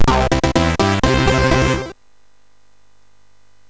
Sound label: Jingles, MOS6581R3; hvsc